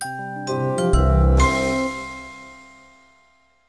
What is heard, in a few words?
Snails win